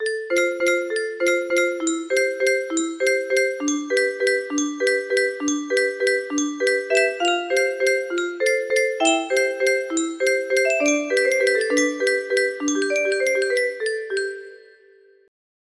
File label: waltz, music box melody